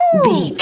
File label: voice fun small character emote interested